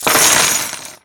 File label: coin spill; pirate room sfx; birthday party bash; wii; Spanish